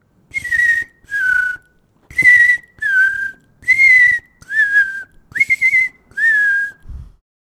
assobiando como o sabiá; quando as laranjas começam a amadurecer, ficamos de olho nele para ver se sobram algumas para nós!; Pia o pio… Os pássaros e as árvores que fazem parte da vida do Martim

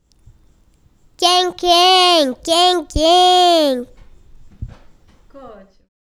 arremedando a gralha; Pia o pio… Os pássaros e as árvores que fazem parte da vida

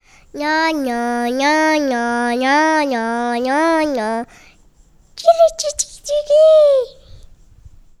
arremedando o tangará; tem gente que se perde no mato por ficar observando a coreografia deles; Pia o pio… Os pássaros e as árvores que fazem parte da vida

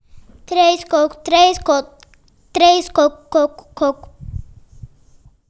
prima do Martim, arremedando a saracura; Pia o pio… Os pássaros e as árvores que fazem parte da vida do Martim

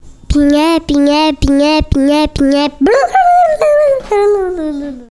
Martim fazendo o pinhé; Pia o pio… Os pássaros e as árvores que fazem parte da vida do Martim

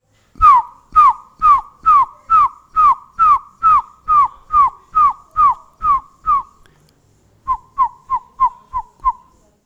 assobiando como o surucuá; Pia o pio… Os pássaros e as árvores que fazem parte da vida do Martim

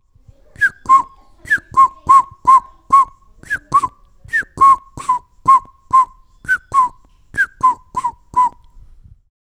assobiando como a saracura; três toco, três toco, três toco, toco, toco, um pinico!; Pia o pio… Os pássaros e as árvores que fazem parte da vida do Martim

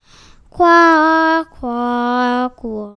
arremedando o urutau; Pia o pio… Os pássaros e as árvores que fazem parte da vida do Martim

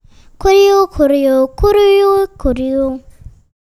arremedando o sabiá; Pia o pio… Os pássaros e as árvores que fazem parte da vida do Martim

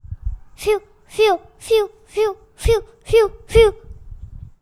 arremedando o surucuá; Pia o pio… Os pássaros e as árvores que fazem parte da vida do Martim

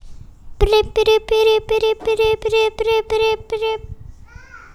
As crianças arremedando a batuíra; Pia o pio… Os pássaros e as árvores que fazem parte da vida do Martim

